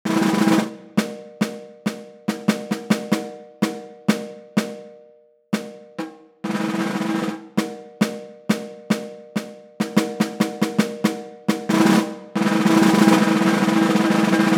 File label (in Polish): Werbel